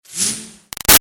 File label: Arrow By Zip; Bow And Arrow Sound Effects; Bows and Arrows; Combat; BigRoomSound